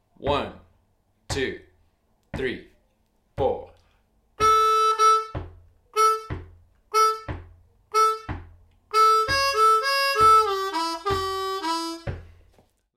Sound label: Lick Slow, harmonica